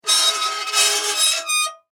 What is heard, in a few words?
Impact Metal Squeak Scrape; Materials; SFX Bible